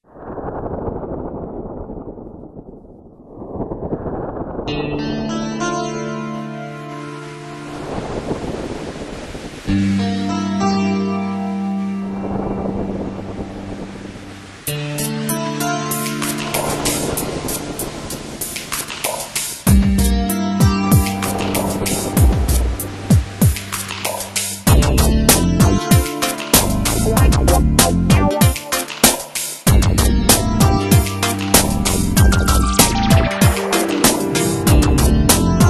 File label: saxophone